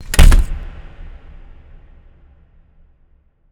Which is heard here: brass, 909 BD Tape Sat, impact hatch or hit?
impact hatch